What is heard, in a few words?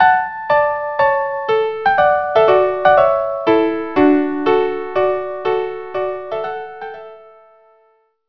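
violin unaccompanied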